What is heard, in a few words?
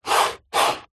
technology computer mouse movement desk